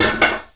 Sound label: Metal Drop